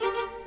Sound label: Violas, Orchestral; Sonic Foundry (Sony Creative Software) - Orchestral VOL-2